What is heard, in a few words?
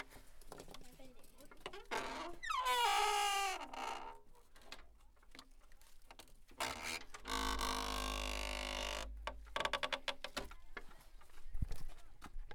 Entering a house, reconstructed ancient soundscapes